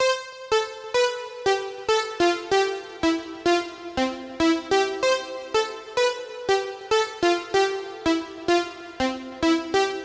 Synth seq; Vostok Inc.

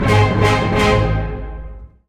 G sharp 3 int Tr 8th; torchlight iii; ps4

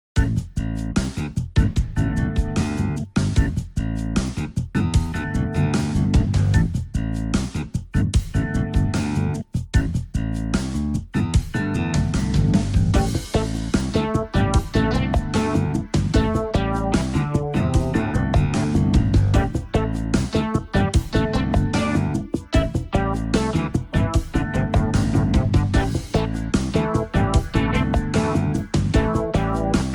Substory Music; yakuza kiwami unreleased songs ps4 gamerip 2019

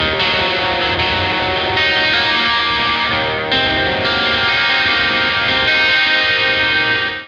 Lake Hi Gtr E flat 9; torchlight iii; ps4

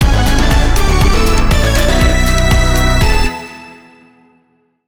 Jingle Lvup New; Megatagmension Blanc + Neptune vs Zombies; ps vita

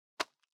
harlequin toad idle action; talking tom gold run gamerip 2016

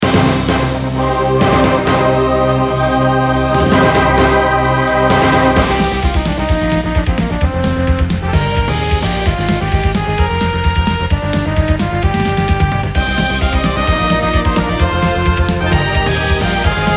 Boss Classic; siralim 3 linux macos windows gamerip 2018

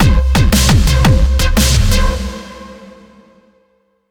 DramaticEvent

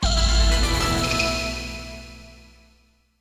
Logo; mutsuboshi kirari windows gamerip 2004

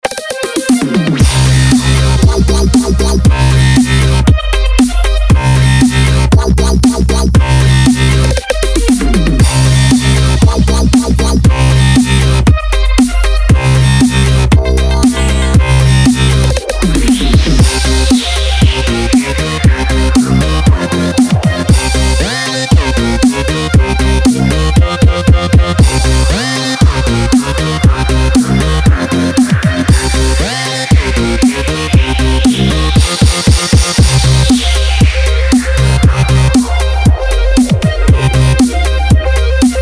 Exploding Bits; Dead Bits

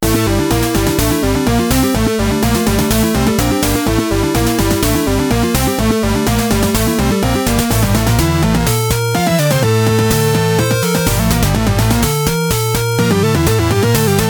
bgm final boss; Buff Knight

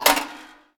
Door Timer Unit Time Up

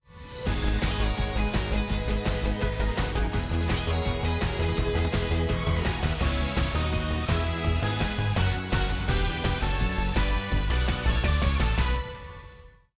Jingle Giftpayoff; miraculous life android ios mobile gamerip 2022

